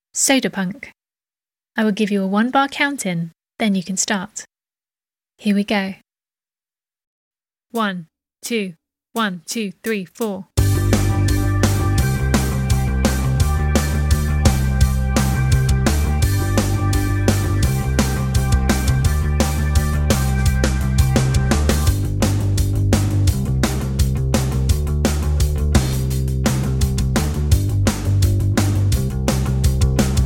Guitar Soda Punk; Orange; Exam

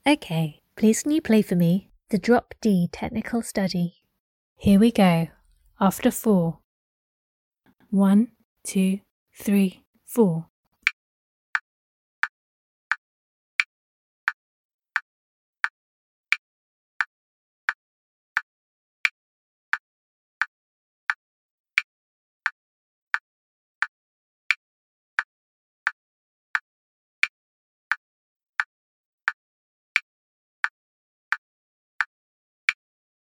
Drop D technical study

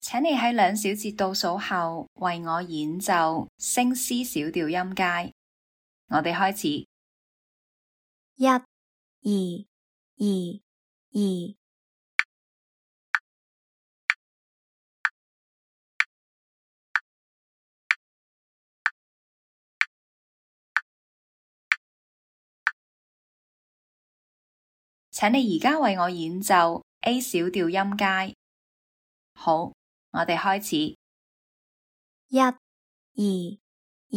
c sharp min scale A min scale

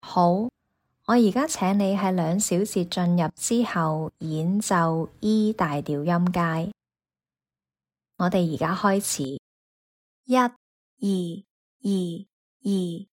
e maj scale

